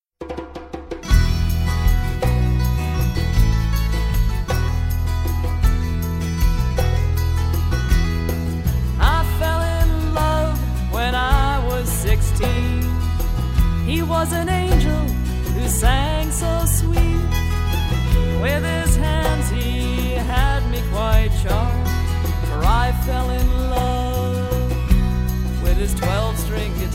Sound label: 12 String Guitar